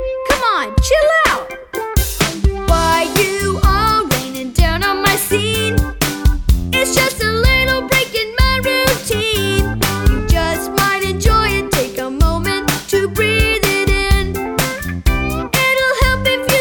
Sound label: Relax Be Cool Chill Out with WORDS